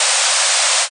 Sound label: static noise